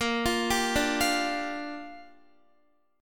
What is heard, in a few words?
A sharp 6th, Guitar; A#6; arpeggiated; x,13,15,12,14,12